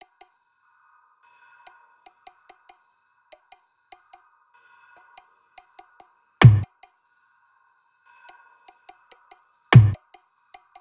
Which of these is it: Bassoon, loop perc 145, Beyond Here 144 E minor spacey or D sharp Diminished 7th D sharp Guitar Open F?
loop perc 145